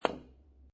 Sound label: Billiard Pool Snooker Hit Billiard Ball With Billiard Cue